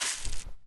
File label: grass, Footsteps; zps; Foley